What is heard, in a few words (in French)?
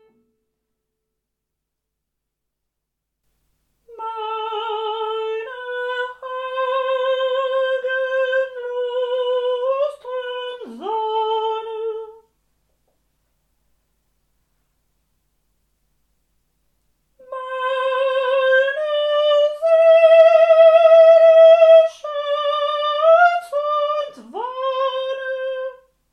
soprani; jesus bleibet meine freude